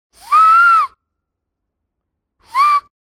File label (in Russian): zvuk svista